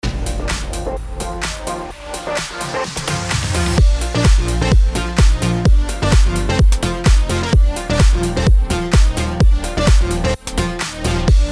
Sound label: HOUSE A#MAJ 128BPM